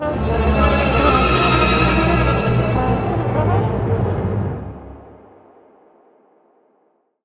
Fly Bye Stinger, gamerip; dying light

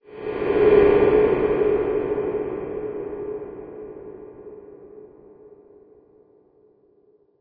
Swell; friday the 13th killer puzzle

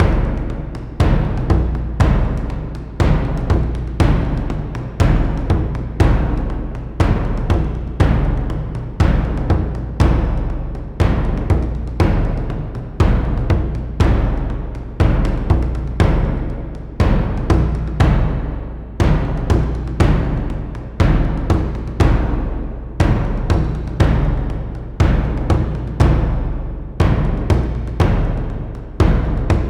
Suspense

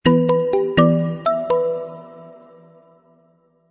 Music Theme

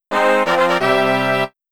Level Up; Fire Emblem The Binding Blade

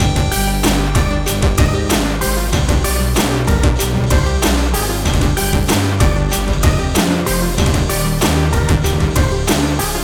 Evil Menu Loop; super meat boy 2015 ps vita

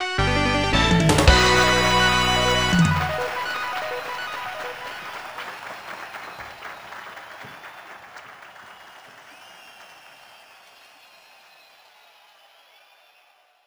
Level Up; backpack hero gamerip 2022

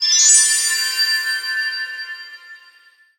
UI Sparkle

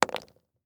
toothpaste tube set down

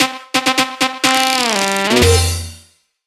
ME SUCCESS

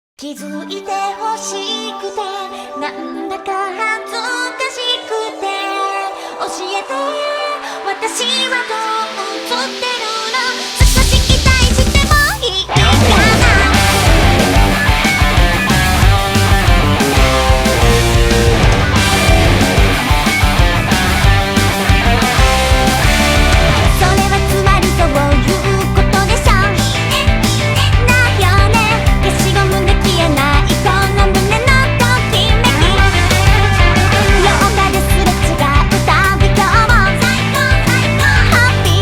op bgm; Ai Kiss; PS Vita; PS4

